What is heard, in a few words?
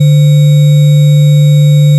additive cello single